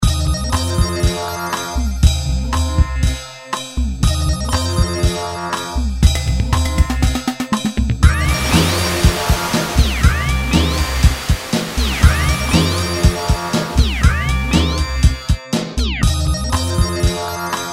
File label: Toy Keys